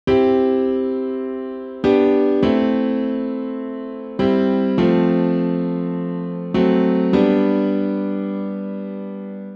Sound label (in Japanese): パッシングコード